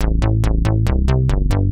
TECHNO BASS 140 A

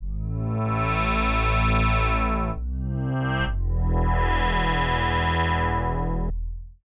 SNTH BASS TEKNO 140 A

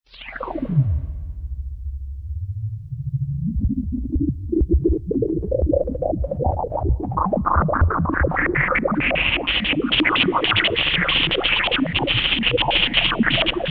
SPACE SHIPS; SpaceSounds

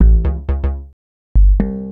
HOUSE BASS 125 A

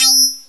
HI HAT TECH ANNOYING; KillerTweaksII; DirectWave

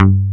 CHING BASS G2

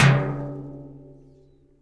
hit, rust kit; Nucleus Soundlab; Ambient Drums

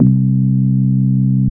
BASS STER.

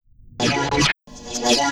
TECHNO EXTRA 140

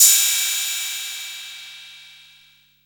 808 CYM, TR-808

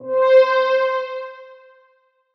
C Synths, One Shots; Keys & Synths; Touch Loops; BlissedOutBeats

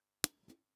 Snares & Claps, One Shots; Touch Loops; CosmicFunk

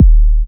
Minimal Kick, Drums, Kicks

